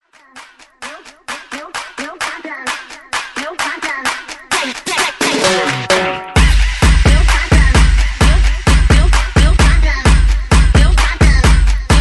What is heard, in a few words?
130 BREAKMIX; DJDOLLS